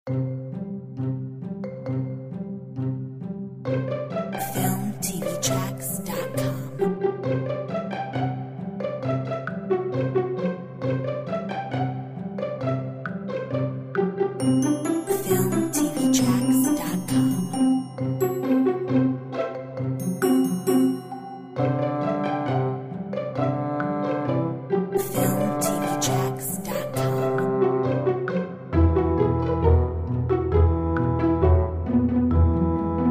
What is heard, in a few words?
Simple Game